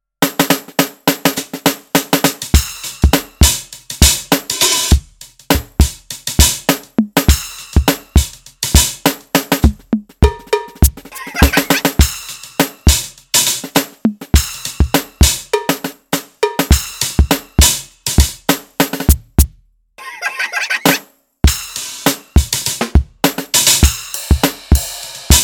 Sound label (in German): gewa G5 Modul Break Beat